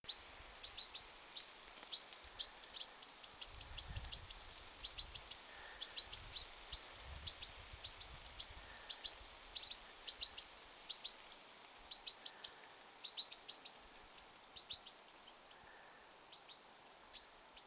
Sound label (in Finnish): tiklipesue aantelee lahella